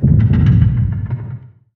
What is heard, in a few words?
Menu Horror; Menu FX